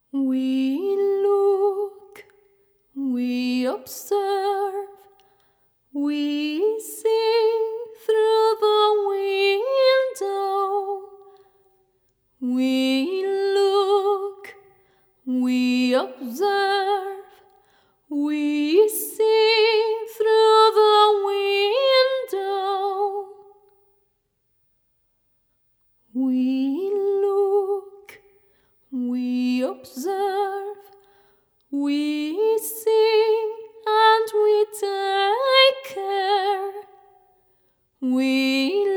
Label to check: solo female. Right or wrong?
Right